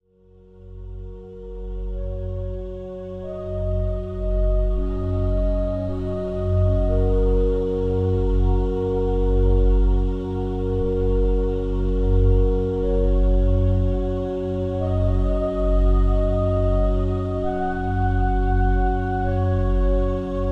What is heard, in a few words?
Meditative wash without effects